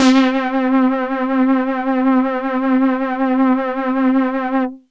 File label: sync osc, yamaha reface cs